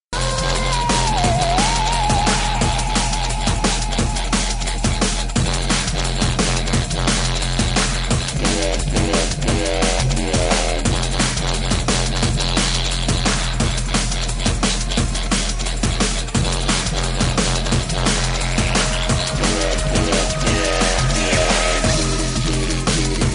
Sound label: Drum & Bass; Sigh-Co Rec